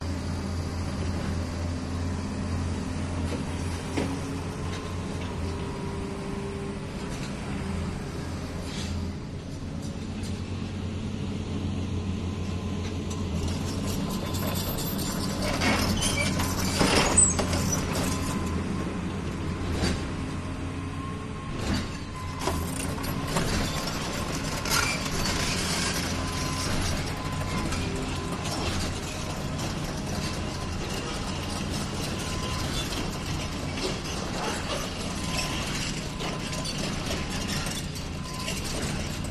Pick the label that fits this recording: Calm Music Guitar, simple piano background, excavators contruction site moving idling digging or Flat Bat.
excavators contruction site moving idling digging